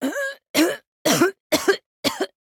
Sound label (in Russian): Кашель, Фраза; Симметра